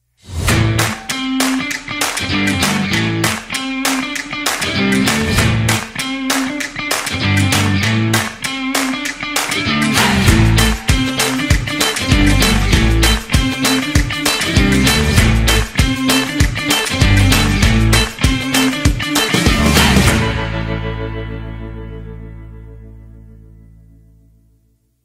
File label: Commercial Song